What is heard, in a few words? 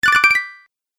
Bonus Collect, video game sound effects